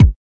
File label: kick